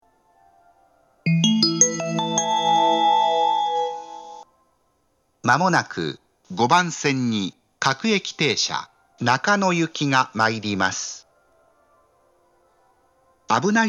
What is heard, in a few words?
Sekkin; Akihabara